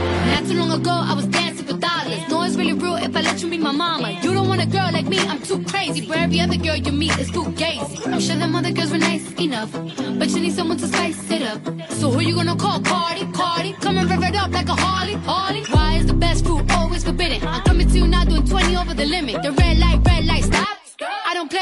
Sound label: English Sound